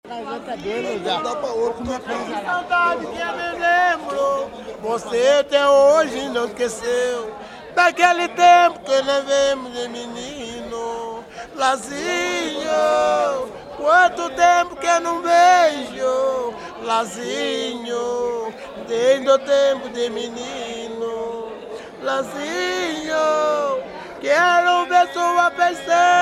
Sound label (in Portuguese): batuque de umbigada, tiete